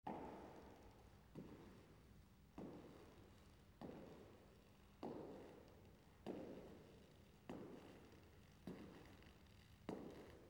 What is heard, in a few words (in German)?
naeherkommende schritte; geraeusche digital